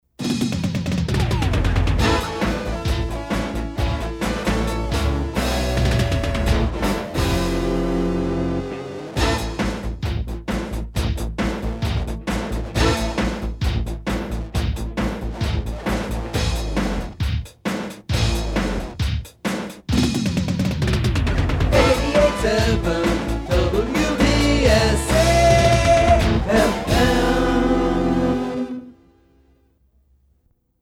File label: JINGLE Cluster Donut Fast